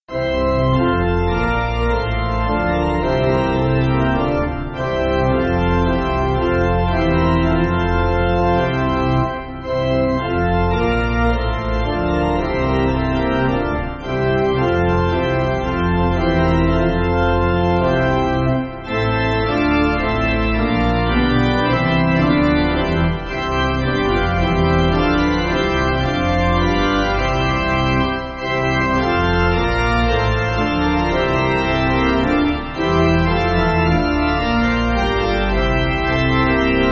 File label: Pipe, Pipe Organ, Backing Files for Churches